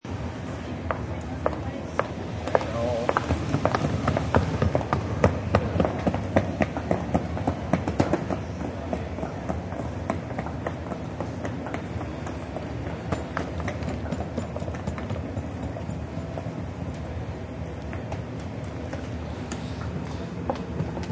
Arprt Ppl Trffc, Background sounds of passengers, announcements, luggage, etc.; Airports